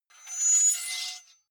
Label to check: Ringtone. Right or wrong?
Wrong